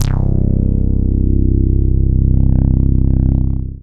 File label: DM BAS, Dance Mega Synth; Best Service